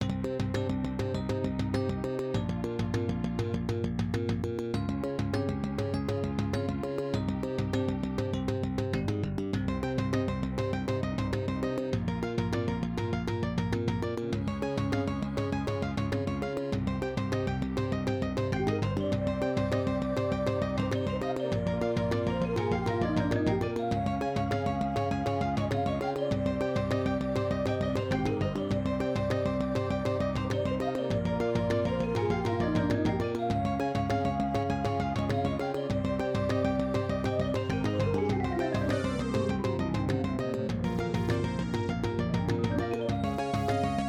intro, Ensoniq Sounscape S-2000; soundcard test; Hocus Pocus

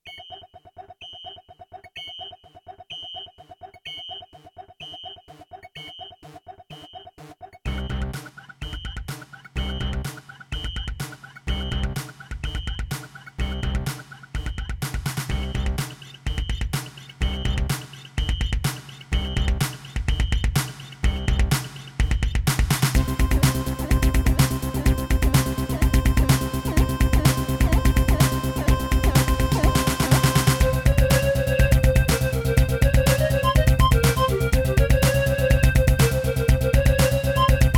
intro, nec xr385; NEC xr385 (Yamaha DB60XG clone); Soundcard test

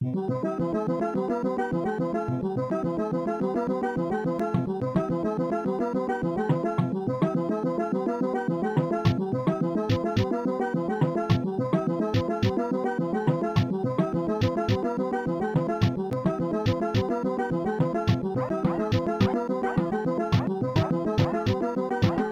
intro, gus pnp megaem gm; Soundcard test page; Gravis Ultrasound PNP

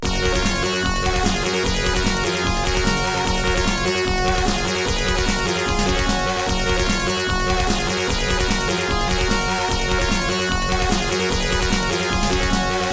sb 16 ct2740; Creative SoundBlaster 16 ct2740; Soundcard test; tyrian

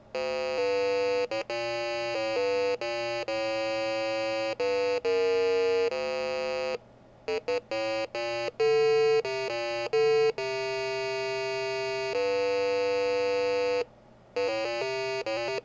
intro speaker; PC Speaker; soundcard test; Quest for Glory 4; Soundcard test page

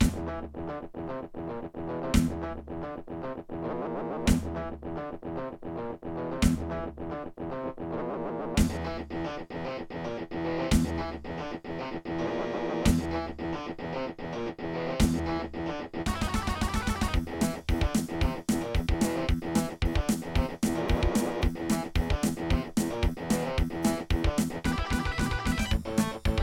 logitech soundman wave; Soundcard test page; Doom

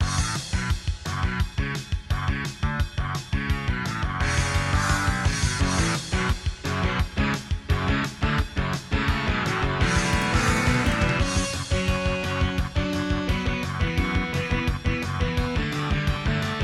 intro, turtlebeach pinnacle; Проф. midi карта; soundcard test; Проф. midi карта, под windows работае; Soundcard test page

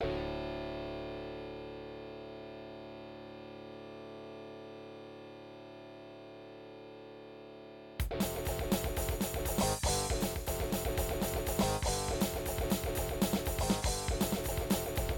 aztech sg waverider32plus; AZTech SoundGalaxy Waverider 32 Plus; soundcard test; Doom

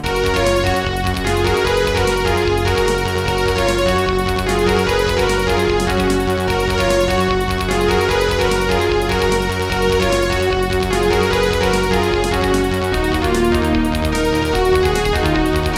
sb awe32 ct2760; Creative SoundBlaster AWE32 ct2760; Soundcard test; tyrian

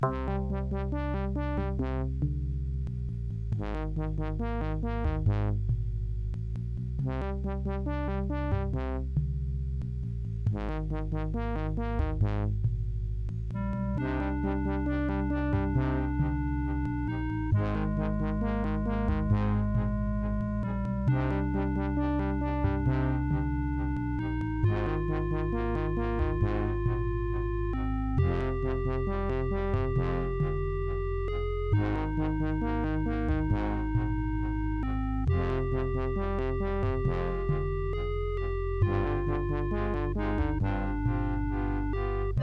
intro, sbpro ct1330; soundcard test; Wolfenstein 3d

